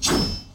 knife; mgs4; raiden

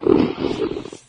death, flood

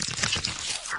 infector idle; flood

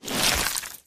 human form short move; flood

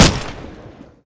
bar shoot, weapons

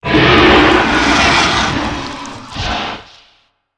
death, dragon

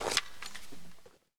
generic cloth fast, weapons handling

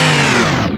saw cut end, circular saw; weapons

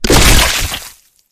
bodyfall combat form; flood

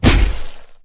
die, flood infection

